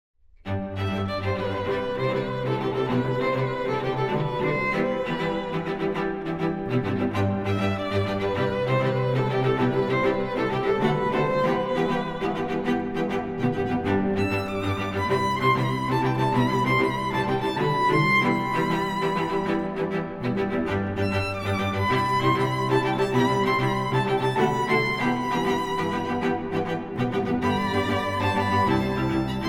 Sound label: divisi strings